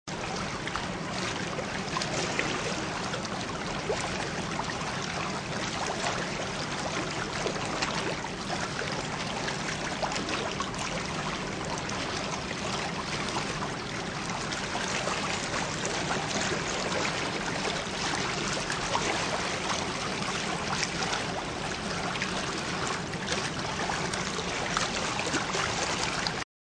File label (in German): donauwasser